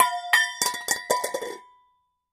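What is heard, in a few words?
Bowl Small Drop On Cement; barrels buckets & cans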